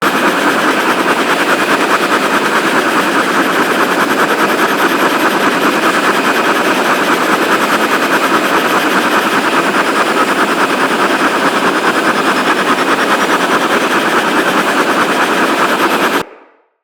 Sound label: Arch Wing Stationary Idle Loop; Vehicles; Movement